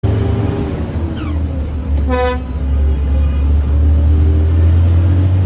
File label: FM gear whistle; جیغ دنده یا سوت دنده